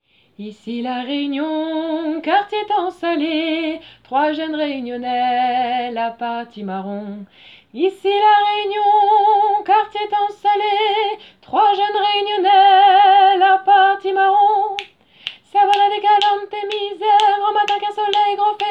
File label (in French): voix haute